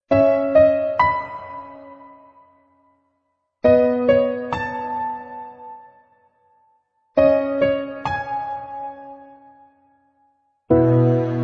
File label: karaoke